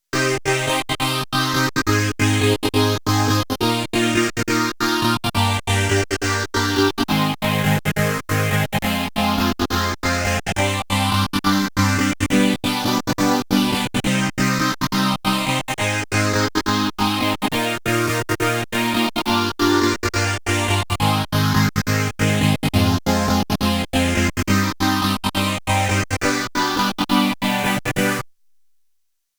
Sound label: synth dry